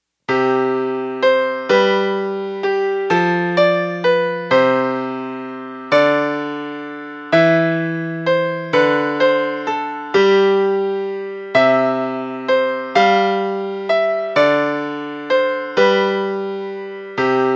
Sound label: melody; Waltz